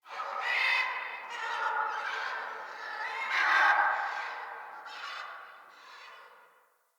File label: Bird Tropical, Ambience